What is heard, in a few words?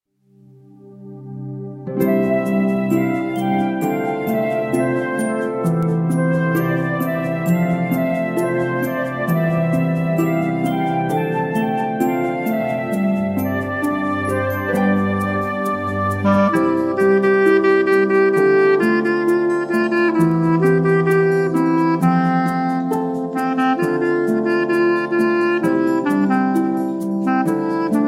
instrumental